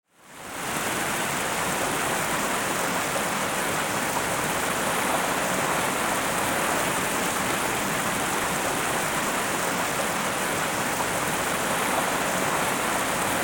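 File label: rain forest monsoon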